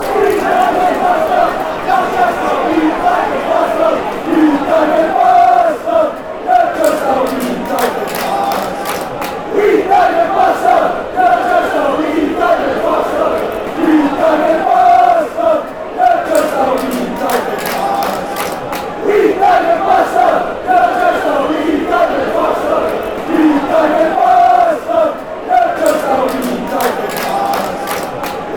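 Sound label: youre just wee, football chant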